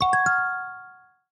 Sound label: Shine, notifications; Huawei HarmonyOS 2.0